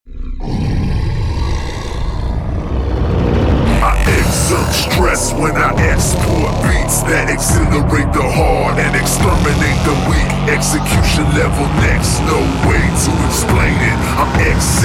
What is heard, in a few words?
DUBSTEP